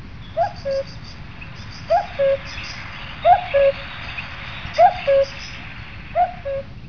coucou

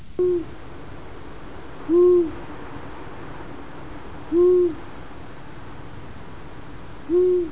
hibou

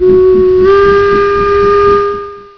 corne, Sirenes

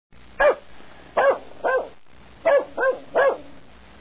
chien

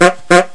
pouet pouet

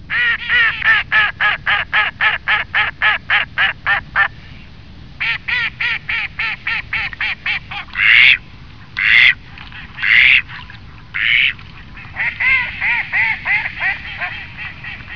canard

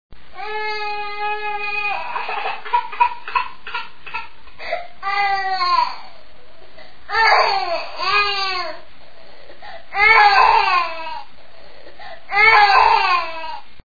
bebe pleure

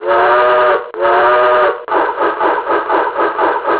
siffletus, sirenes

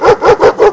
chien

